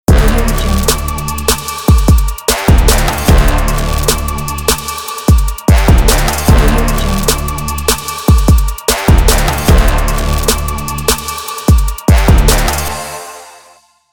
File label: dark trap logo